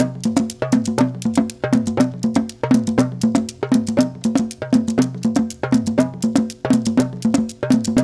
120 JU, African Contemporary Ju Ju; Spectrasonics; Supreme Beats

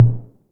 808 MT Tape, Tom; Goldbaby Tape808 & Tape909

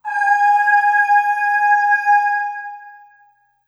Aah Short G#4 Short Reverb; Harmonic Building Blocks

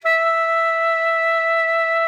E5, alto sax; Xsample VOL-2

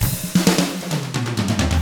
HARD ROCK, Drums

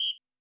WHISTLE 2, Yamaha SY85, Drums

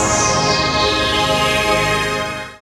SYN, DANCE SYNTH

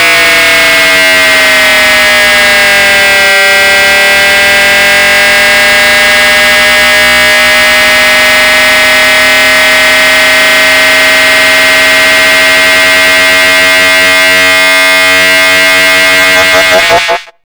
TELEPORT, Hard & Loud Techno; USB Soundscan vol.01; AKAI

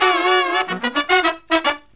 Brass Riffs

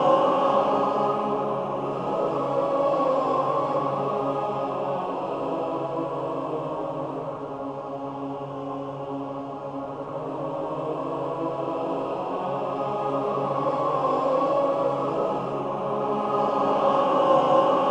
ROUND SPIN, rounds, voices

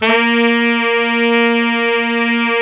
TENOR SAX, SLOW SAX; Giga Samples Collection

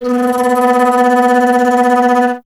flute B; Miroslav Vitous - Solo Instruments VOL-2; Solo lnst. II

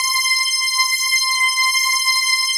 Dream String Synt, C; E-MU Producer Series Vol. 5 – 3-D Audio Collection; 3DSynths; 3DreamStringSynt

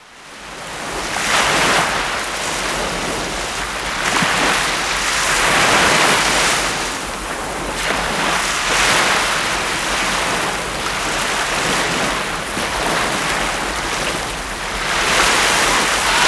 SEA, SEA WATER; Best Service - XXL Production Effects

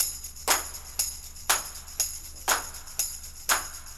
120 tam cp

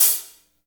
HH hh; Best Service Gigapack 2

